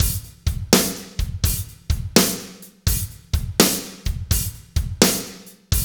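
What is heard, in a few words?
slow shuffle, Roland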